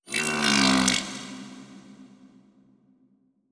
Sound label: Critter Female Verb; Kahlo